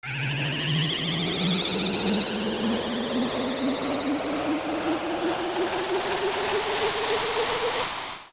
Seal; Evil Realm

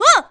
Ledge Fall; Link falling and grabbing a ledge; Zelda

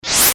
Boss Sand; LTTP

